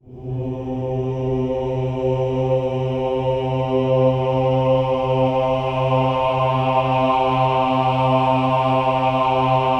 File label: OH-AH C3, choir; Spectrasonics; Symphony of Voices